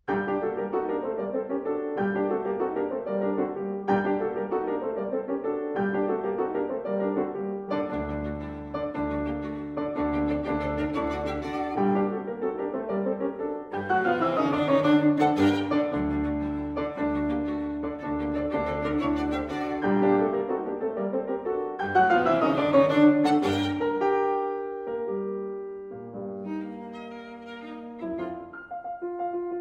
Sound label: piano trio; marschner